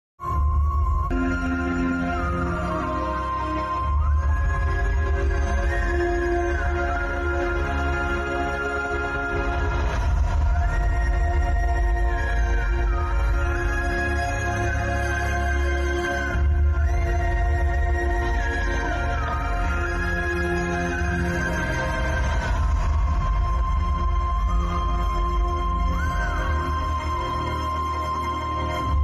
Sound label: emotional bgm ringtone; sir movie